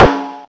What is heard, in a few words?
processed snare, Deep House; Zero-G Deep House; single hits